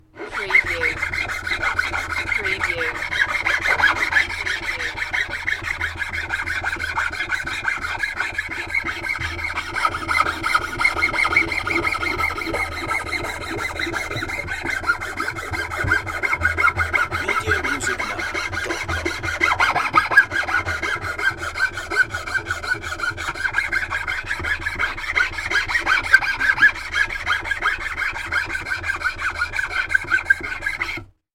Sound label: Handsaw